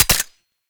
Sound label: Pistol Mech; Weapons; DE; Warframe; Tenno; Lasrian